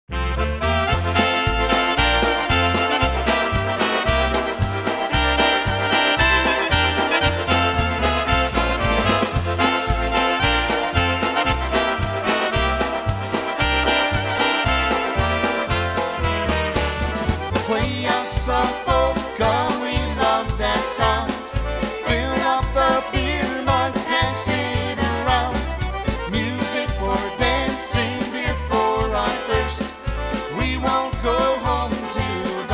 Polka

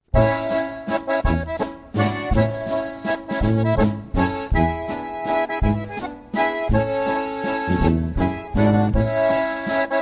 Waltz

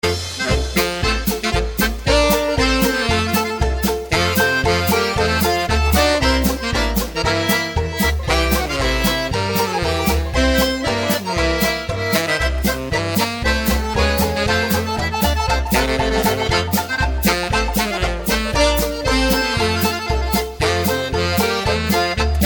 Polka

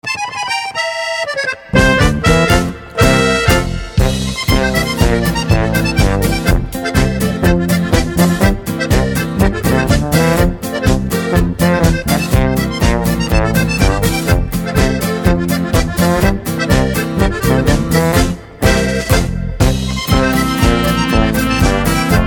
Polka

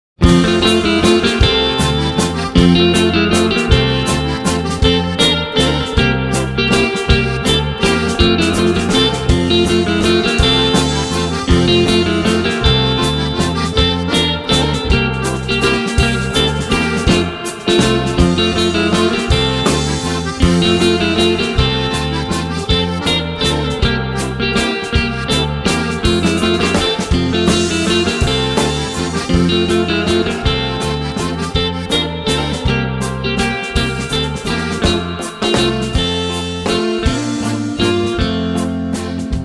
Owl Waltz

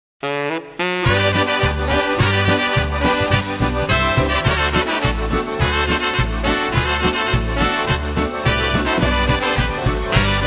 Polka

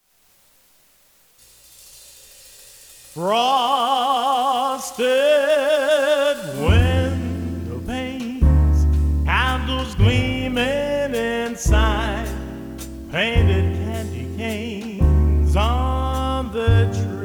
Christmas Waltz

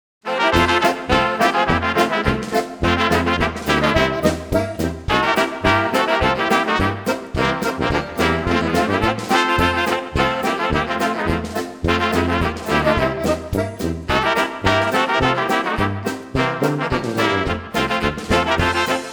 Polka

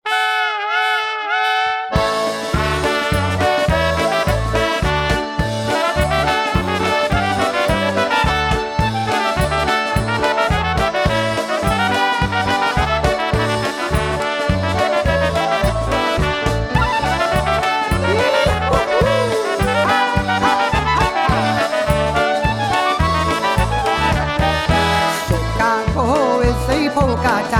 Polka

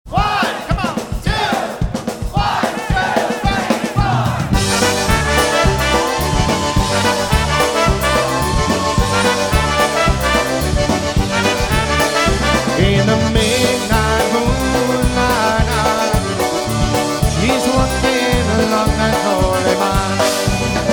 Polka, Live Jam

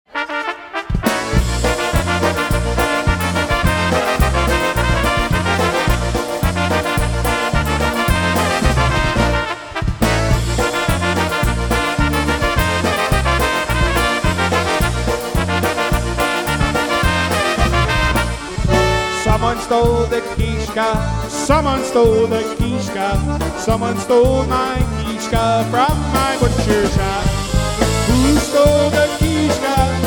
Polka, Live Jam

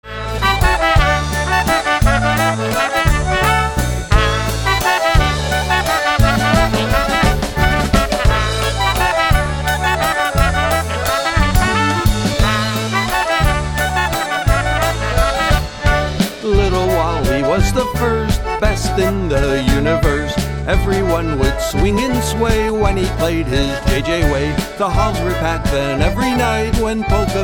Polka Great Oberek

